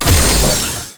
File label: Service Door Open; Con Junction; Warframe; Entrati; Ambience